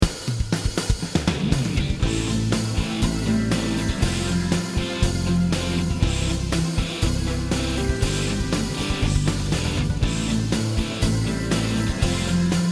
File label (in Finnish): soolo tausta